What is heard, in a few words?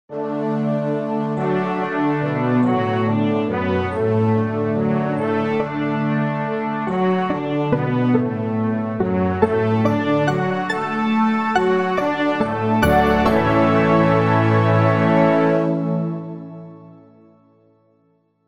Fanfare